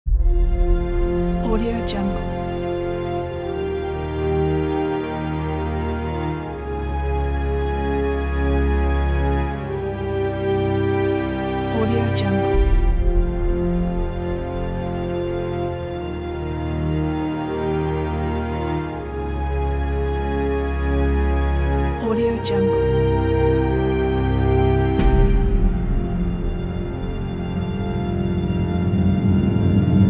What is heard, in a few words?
promo intro trailer